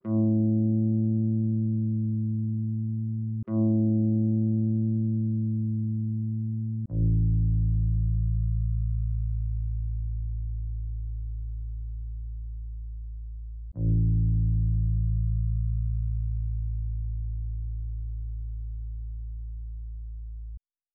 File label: 140 BPM A Min Bass; Cymatics; Fearless; 2022 Melody Collection; Drill